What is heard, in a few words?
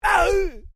MALE VOCALIZATION ARGH